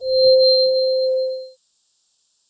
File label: Tone; GoogleVR; HelloVR